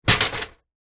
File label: industrial step ladder metal set down on side